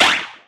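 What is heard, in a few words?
impact bullet generic titan shield; Titanfall 2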